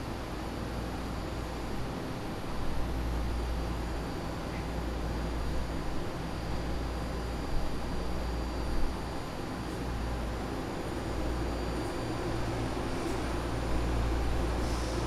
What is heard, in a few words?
Train Loop, BART Legacy Fleet Inverter